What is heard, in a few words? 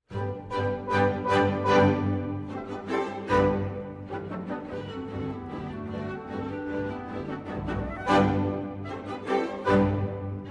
piano